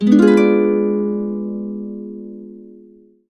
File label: harp chords, B dim7; USB Soundscan vol.62; Complete Harps; AKAI